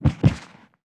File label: Death Body; Magic Shrek